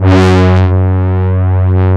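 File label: MEMOOG BRASS; E-MU Formula 4000 Series Vol. 3; Analog Odyssey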